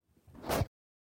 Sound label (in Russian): perehod koshbaka